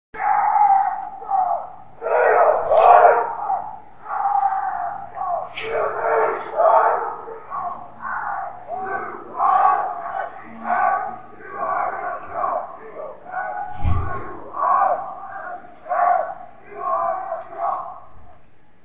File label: chanting